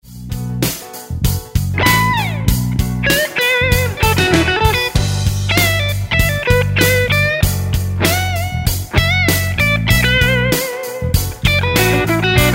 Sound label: Funky Lick, guitar; 20 Minor Blues licks